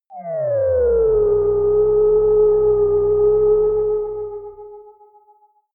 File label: hovering ufo